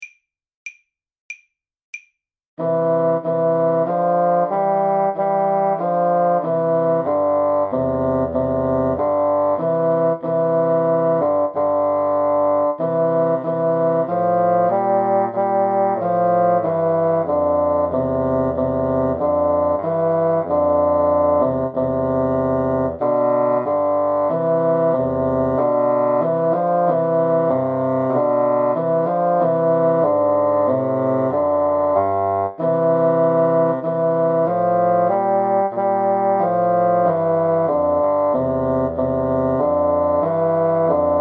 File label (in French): hymne joie duo, basson